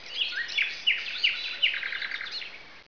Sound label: gardens; CAESAR3